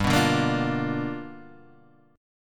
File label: G 7th Sharp 9th G, chord; G7#9 Chord {3 2 0 3 3 1} strummed